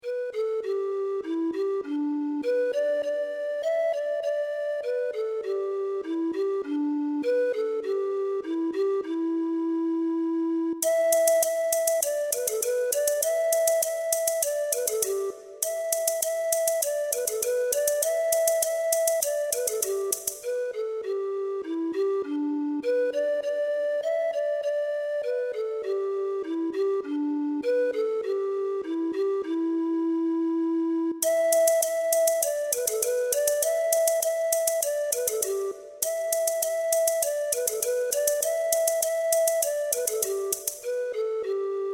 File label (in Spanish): TAMBOR; Cielupi Estrella